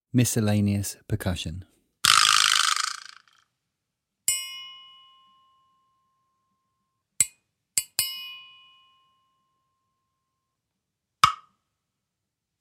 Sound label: Misc Percussion